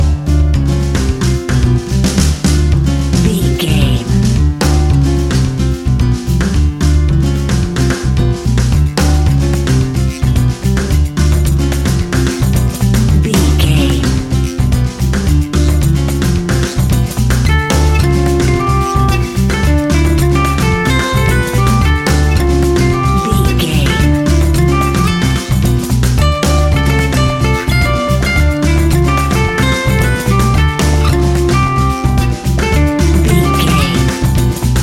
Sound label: fast swing latin jazz music; An exotic and colorful piece of Espanic and Latin music